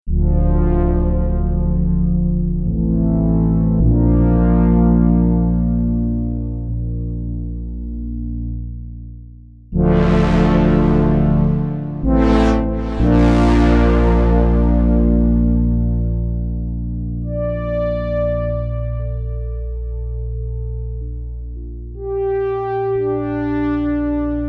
REV2 Brass

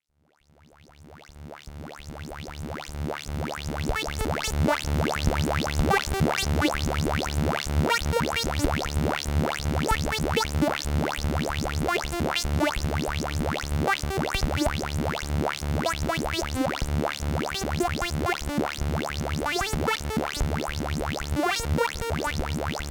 A100 LFOs Dual Filter LFO

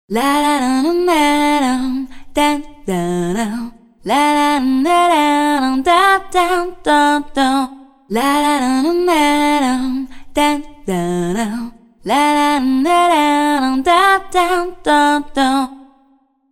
RCF M 20X Plate

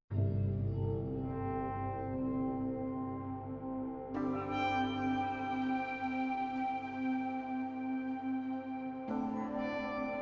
Sounddesign

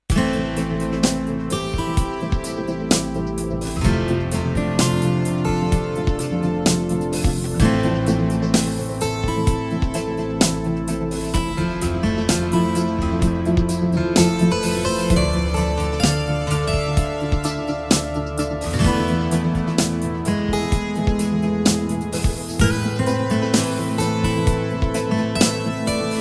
Steel Vocoder

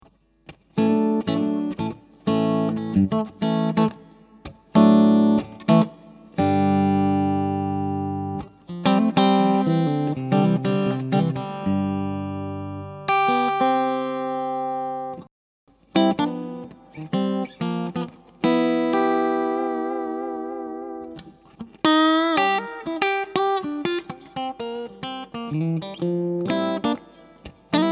orange clean strat 4pos

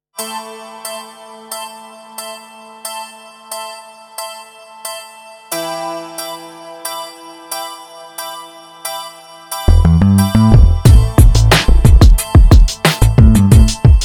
Beats passive mixed limited; Gyraf GXXIV

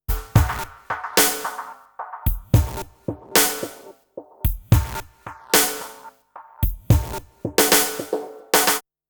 Loop Wet

